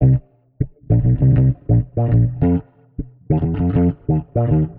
Warped Bass, 100 C; Loops; When Im Gone; Touch Loops; CosmicFunk

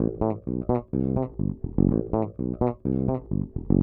DEEPHOUSE BASS 125 A

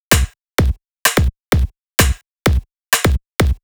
drum loop 128 Perc; Anthemic

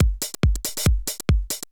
TECHNO BEATS 140

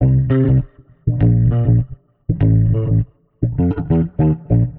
100 C Instant Funky, Warped Bass; Loops; Touch Loops; CosmicFunk

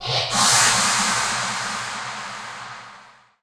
TECHNO FX 140

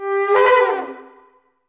SHOFAR; ETHNICSTUFF

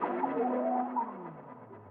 ethno, 125, A

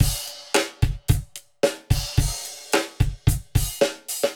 110 Strictly Riddem, Warped Live Drums; Loops; Touch Loops; CosmicFunk

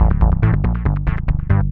SNTH BASS ELCTR 140 A, Techno

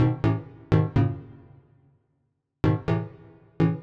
DEEPHOUSE ORGAN 125 A

